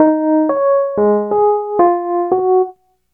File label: pan; 90bpm; Demo Multitracks